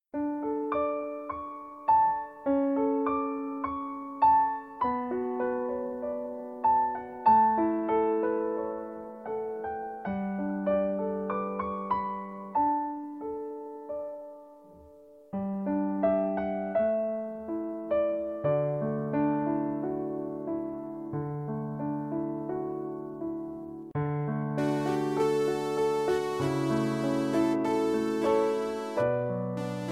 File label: Piano Parts